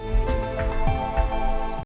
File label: startup; NATICQ2; Naticq pack